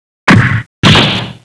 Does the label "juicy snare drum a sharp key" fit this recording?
No